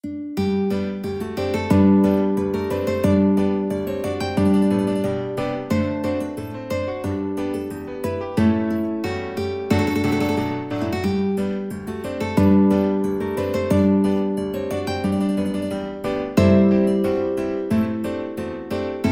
mandolin

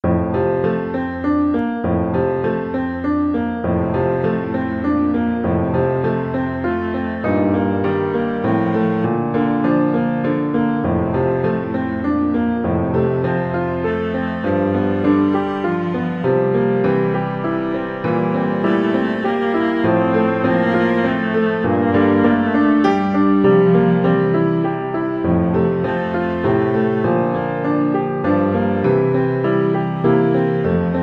cello

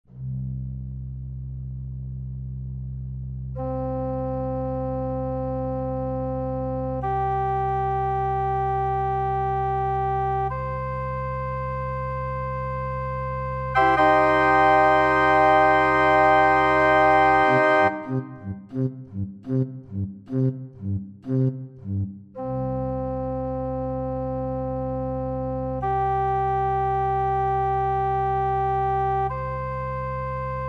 organ

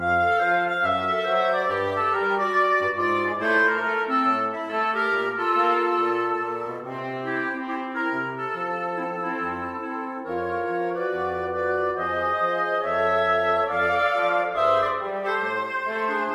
jump blues, wind quintet; joy to the world

